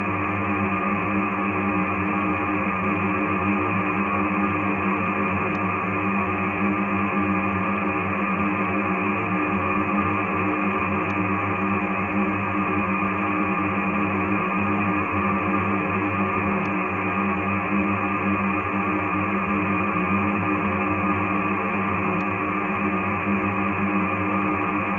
Drone Three; SoundChaser; CerebralAudio

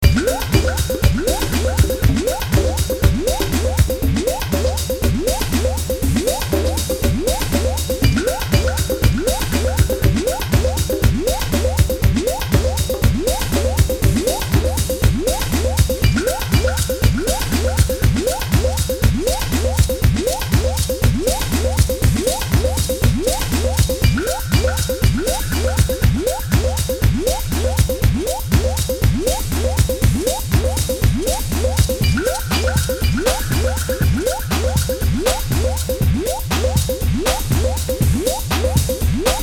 electro jam

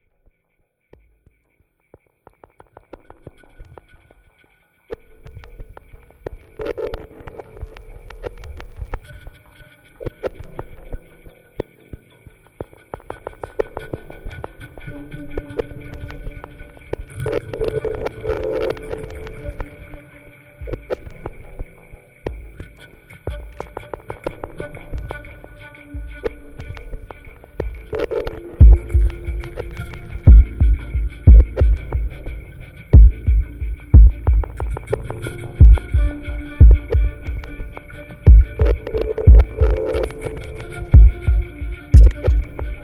Flute

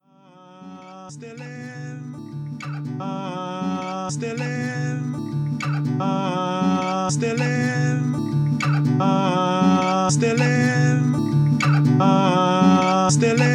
Thuoom Folk

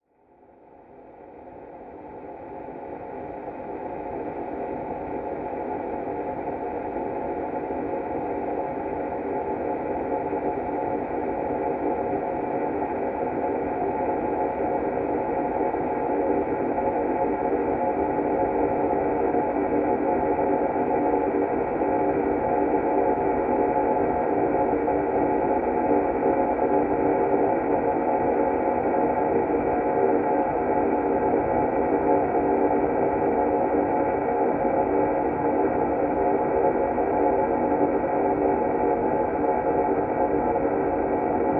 Sample Tri Tones Submix Three; SoundChaser; CerebralAudio

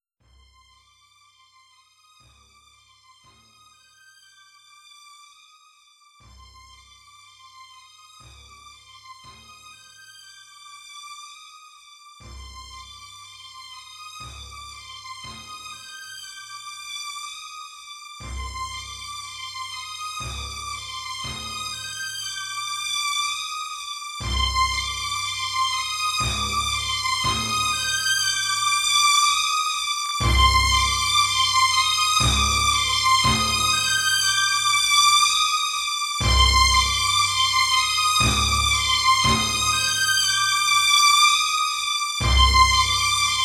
piano; Noctiluca

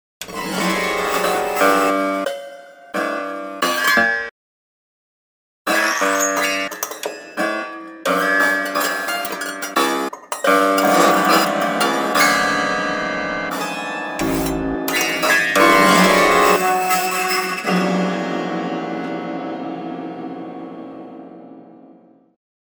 Prepared Piano Piece

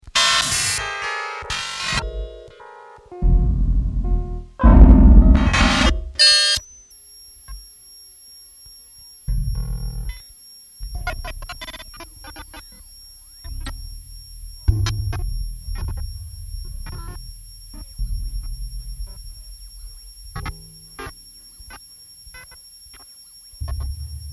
Piano; Princess; plump

